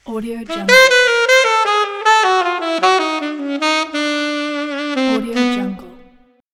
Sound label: jazz logo